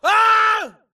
grunt death crush; Titanfall 2

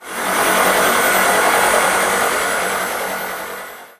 drone move; Titanfall 2

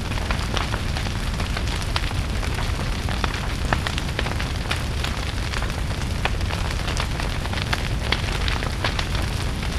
fire loop big crackle; colony; Titanfall 2

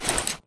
Grunt Mvmt FOL Soft Short; Titanfall 2

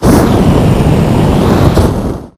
amb fire blast; Titanfall 2

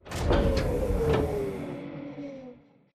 crane shutdown; Titanfall 2

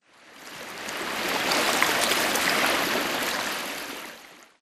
swampland water lapping; Titanfall 2

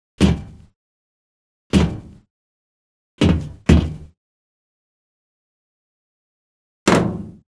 conductor feet; Commander baker; Titanfall 2

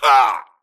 grunt death neck; Titanfall 2

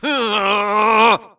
grunt electro; Titanfall 2

